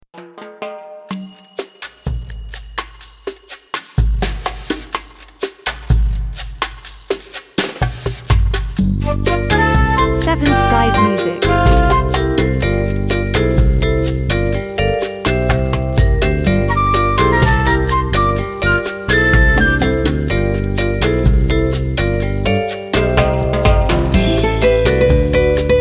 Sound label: Latin Jazz